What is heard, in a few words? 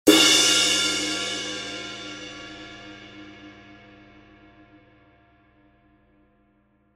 20in Crash Ride, cymbal sound